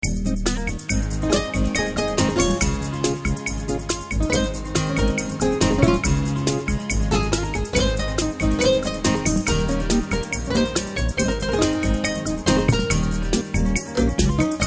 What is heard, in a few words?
Love Soft Bossa Nova